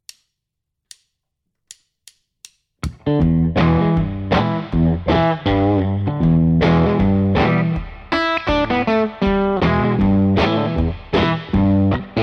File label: Backing Guitar